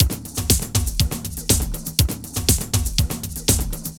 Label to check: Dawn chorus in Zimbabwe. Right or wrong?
Wrong